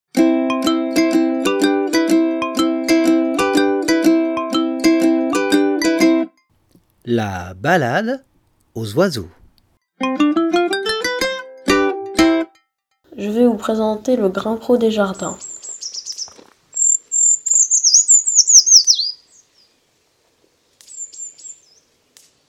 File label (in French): grimpereau; la balade aux oiseaux